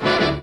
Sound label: two horn blasts